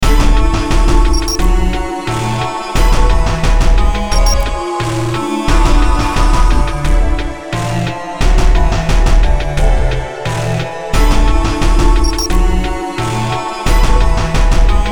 BEAT CHOIR, Beat Loop Choir Electrical Glitch Synth; Miscellaneous Loops; Blastwave